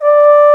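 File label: Trombone mf D4; Digital Sound Factory Virtuoso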